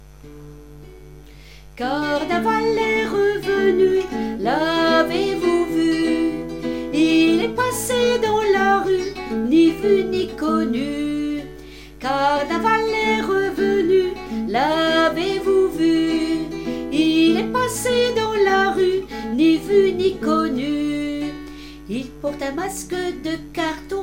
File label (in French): Carnaval est revenu Chant